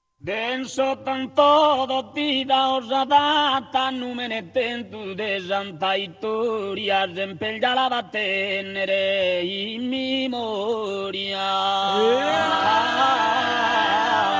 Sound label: tenore